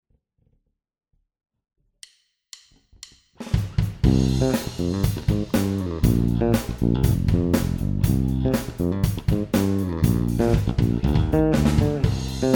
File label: Bass; Focusrite